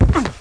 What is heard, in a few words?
hit wall; duke nukem total meltdown